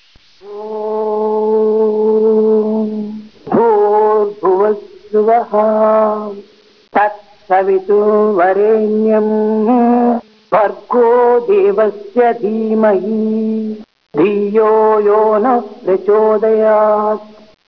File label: gayatri